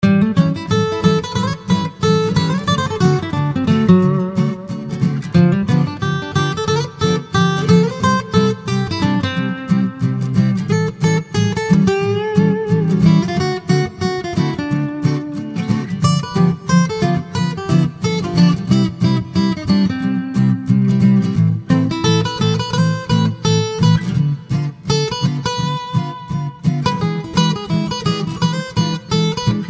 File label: Gypsy Swing Guitars; Vanilla Groove Studios